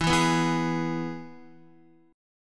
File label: E-Major E, Guitar; strummed; JGuitar